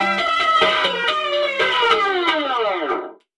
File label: Zombie Arcade Basketball Stop; call of duty infinite warfare; ps4; windows; xbox one